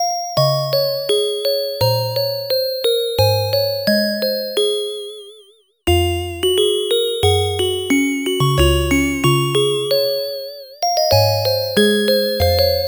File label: OB-X8 Triangle Bells